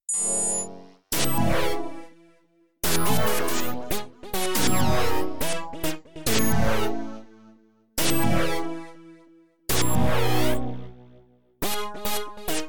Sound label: syclavier; arturia